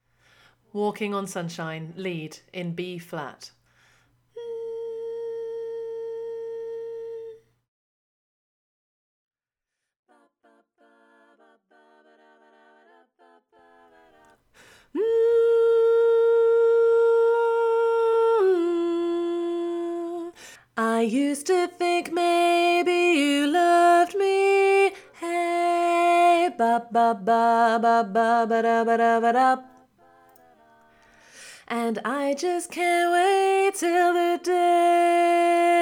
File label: Lead dominant, harmony